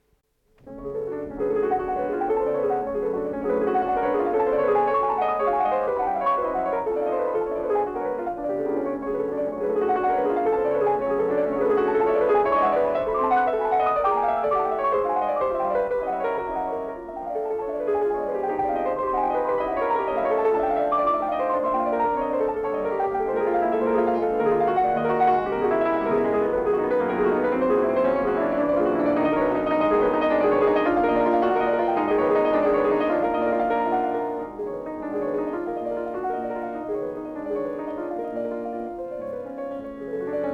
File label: Prelude op. 28 No. 8 in F sharp minor; Richter; Chopin; Live Moscow, June 1950